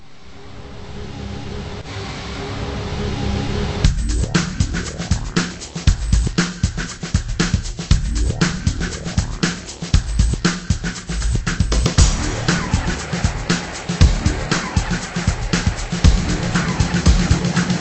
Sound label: Menu Loop